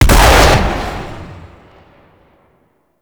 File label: Pump Shotgun Alt Shot; Weapons; Warframe; Zariman